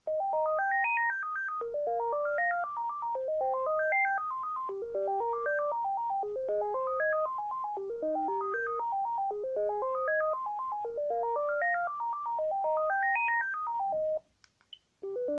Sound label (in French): telephone fixe sonnerie